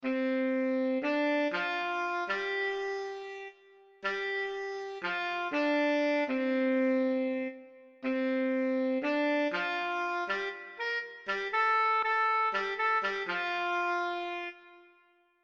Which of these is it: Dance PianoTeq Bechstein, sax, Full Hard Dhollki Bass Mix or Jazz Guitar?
sax